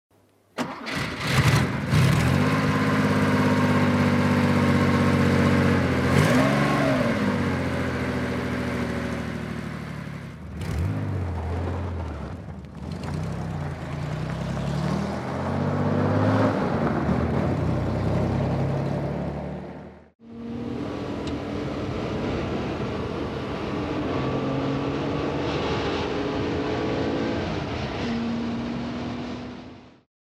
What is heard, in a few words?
Porsche 901 Coupe